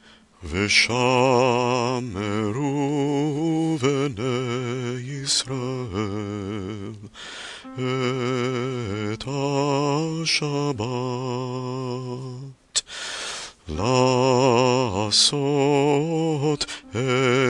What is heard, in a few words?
veshameru melody